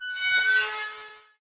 bridge gate open right; DeadFright

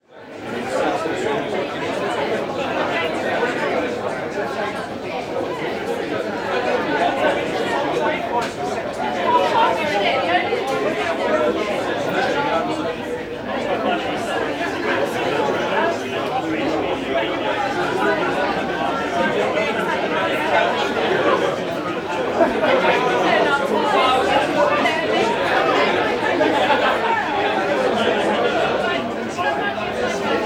Bar Pub Busy Active Voices, Walla; Sound Ideas; Walla Series

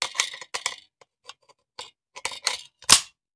Machine Gun Foley; Sound Ideas; Guns

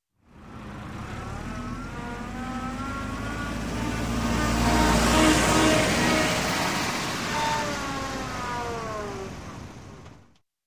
Standard Car Transmission; Sound Ideas; Series 2000

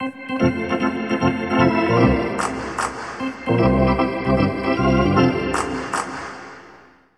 Hockey Organ

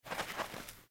Snow Heap; Blind Quest - The Frost Demon

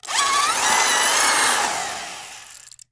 Electric Drill Tighten; Tools; 1001 Sound Effects

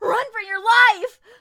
town human far; Blind Drive

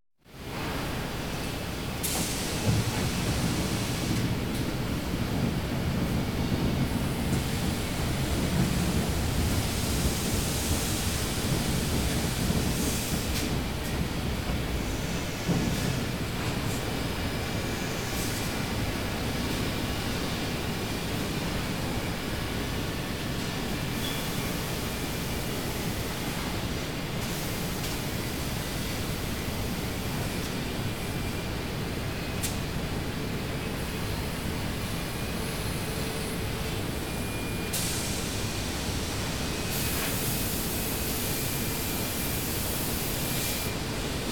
Factory General Atmosphere; KMP Film Video Sound Effects

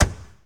Ferrari 355 Trunk Close; Automobiles

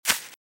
Velociraptor - Footstep - Grass - Run - Left; RadwinIsland

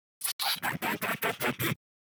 Insect Squeal - Slower; Hollywood Edge; sound designer's toolkit v2

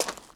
gravel; firefight

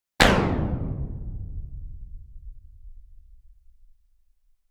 Impact Echo Metallic Hit Going Down; Hollywood Edge; sound designer's toolkit v2

